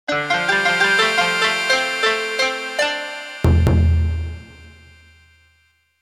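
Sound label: Japanese style Jingle; PocketSound; wafu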